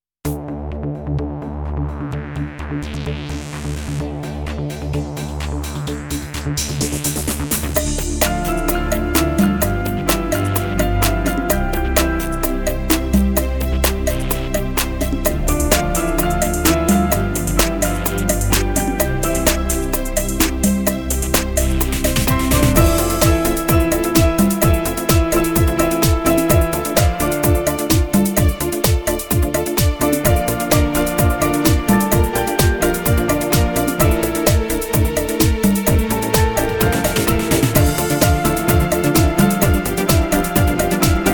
cha cha

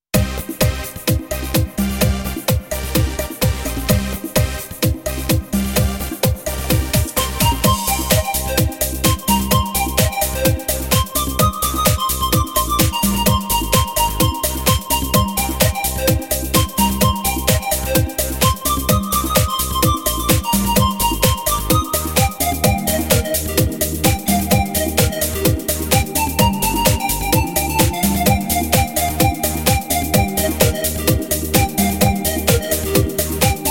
cha cha

